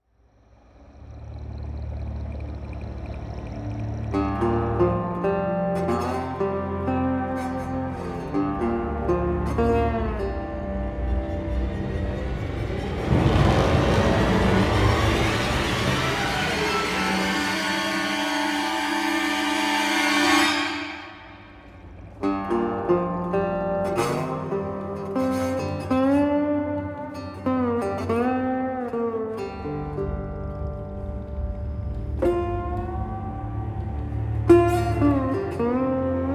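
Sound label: ambient orchestra guitar; the walking dead survival instinct gamerip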